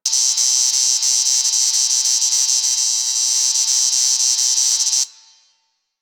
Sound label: Broken Light Loop; Ambience; Props; Warframe; The New War; Zariman; Quests